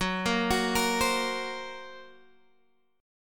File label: F sharp Major Flat 5th, Guitar, Half Step Down